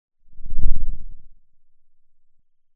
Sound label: pop